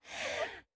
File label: audience gasp